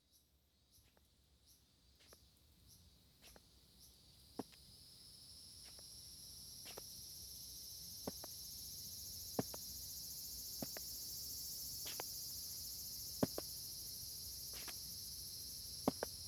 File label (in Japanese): 魅惑のペンギン駐車場（ペンギン餌やり音・動作音。ペンギンたちの鳴き声）; アルジェ